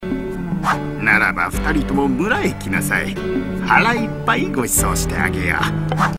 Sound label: jingle; village mayor; dragon ball tenka ichi daibouken